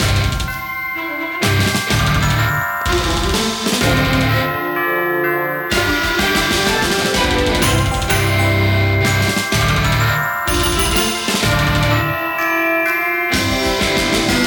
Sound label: Music Frost Moon